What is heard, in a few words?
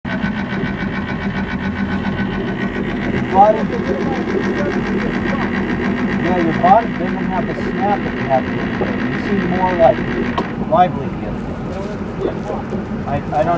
boat sound